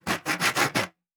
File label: Saw Wood; Fantasy Sounds Bundle; Craft